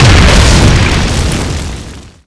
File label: lock on gun exp